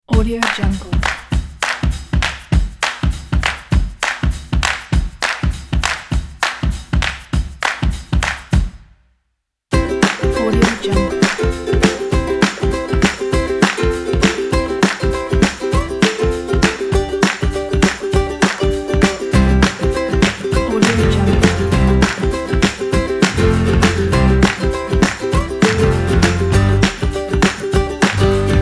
energetic upbeat folk

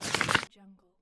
board game manual page rustle; stormwaveaudio

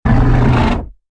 savage beast roar

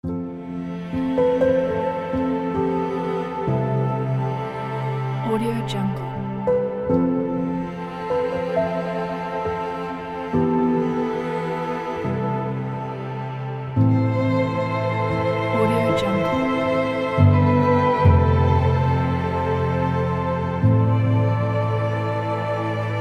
romantic evening

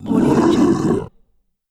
demon death

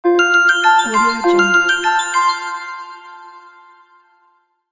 alert bell ringtone

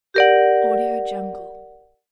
magic wand retro

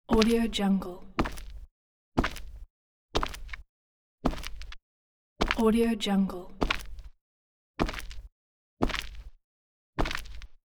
footsteps

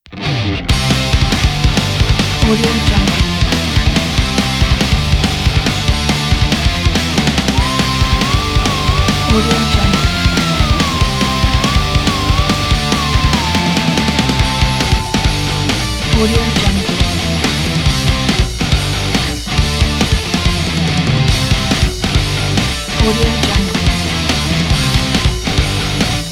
melodic pop punk; truesounds

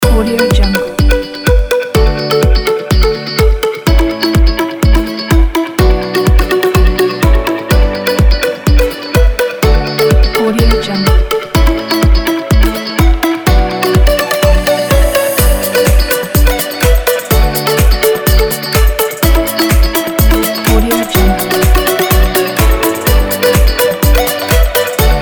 tropical upbeat pop

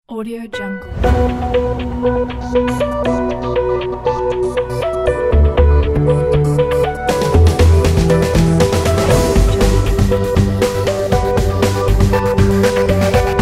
dancing emotions ident

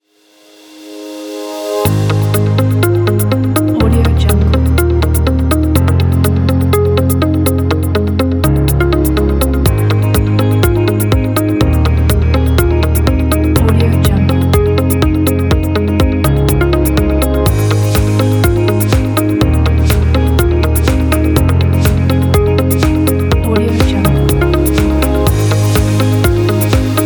fast dynamic; music saq

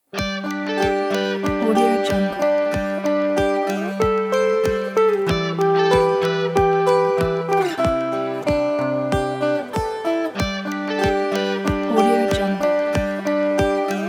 peace rock upbeat; audiocasket

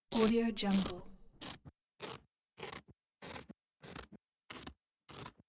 scissors cutting fabric

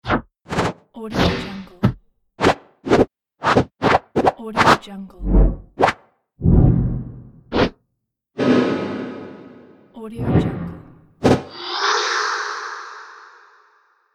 transitions pack

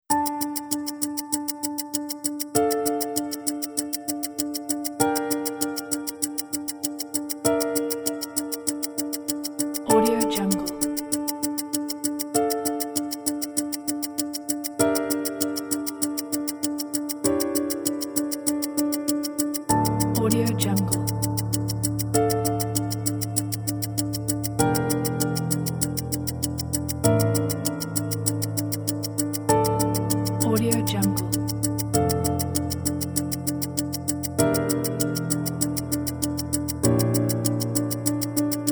ticking piano tension

